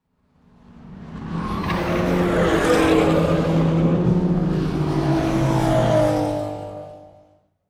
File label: Race Car Drive By 180D; transportation